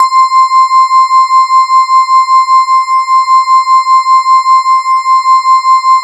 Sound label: E-HARP, DX HARP; Wizoo - Powered DX